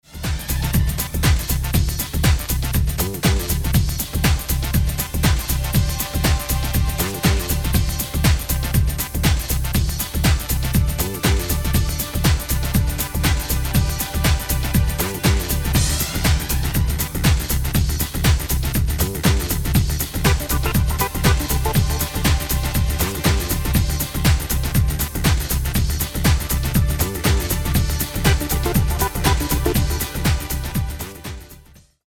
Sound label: No guitars